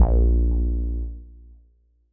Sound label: M Elec Bass A#1; KG MegaSaur